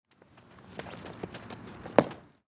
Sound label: ball flying ball catch